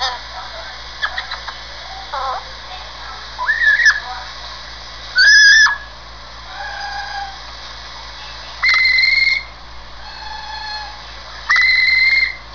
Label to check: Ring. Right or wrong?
Right